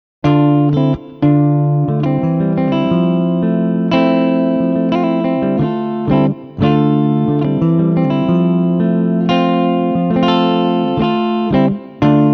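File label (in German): Beide Humbucker Clean, guitar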